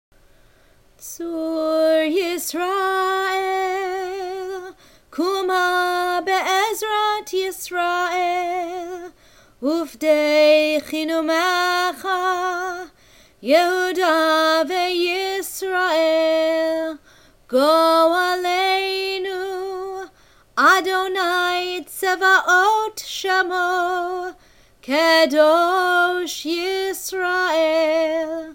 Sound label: Tzur Yisrael, Young Israel chant; MorningPrayer